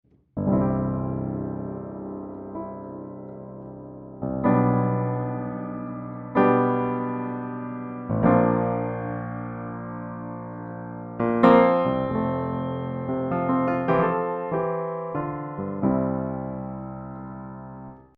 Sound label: U4 tall natural